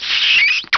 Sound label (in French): bisou